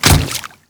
Water Step; Enemies; Footsteps; Warframe; Grineer Royal Guard

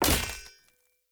Short Sword Throw Medium Impact Wood Hollow Large

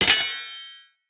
Short Sword Medium Parry Impact

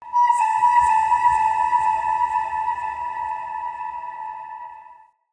Spirit Tree Holy Chatters

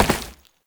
Step Ice, Foley; Warframe; Oraxia

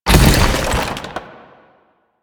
Motorcycle Collision Fast; Warframe; Motorcycle Ninety Nine

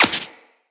Laser Impact Flesh; Weapons; Bullet Impacts

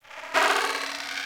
Beep Stutter; Warframe; Corpus

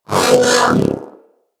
Zanuka Companion Vocal Alert; Pets

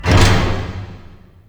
Wisp Door Start; Ambience; Props; Warframe; Tau; Circles Of Hell

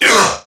Pain Crewmen; Dialog; Barks; Battle; Warframe; CorpusCrewmen; CrewmanA

